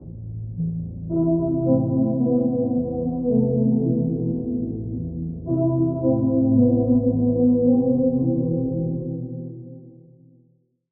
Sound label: Key; Apathy Faith; Routed Sound; Vital Expansion - Street Vanquisher Vol.1